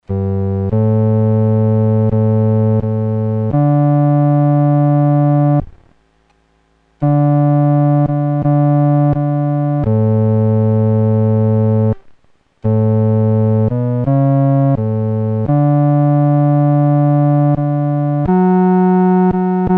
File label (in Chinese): 独奏 第四声; 垂听我祷告